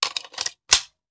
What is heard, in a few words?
Machine Gun Foley; Sound Ideas; Guns